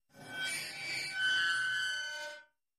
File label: Metal Creaks Slow Scr, scrapes